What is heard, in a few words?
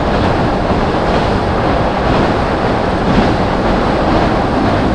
Run, train; OpenBVE